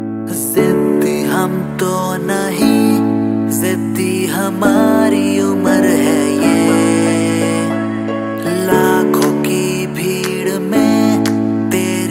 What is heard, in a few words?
ringtone bollywood ringtones